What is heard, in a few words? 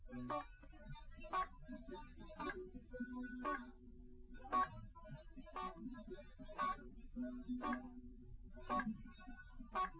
nodrums live rec; SiSEC08; SiSEC underdetermined